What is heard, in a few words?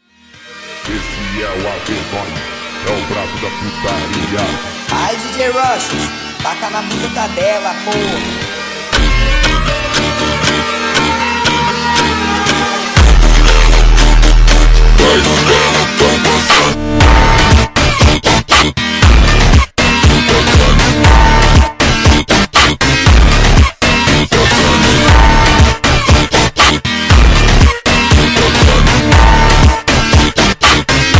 Phonk MONTAGEM